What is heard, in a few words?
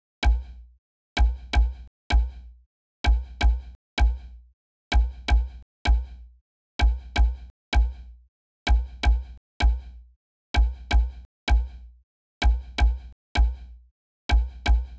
perc, Key E Bpm 128; Big Room House; Loop Nerds; Big Room House Allstarz